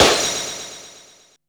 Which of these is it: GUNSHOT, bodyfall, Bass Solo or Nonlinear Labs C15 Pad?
GUNSHOT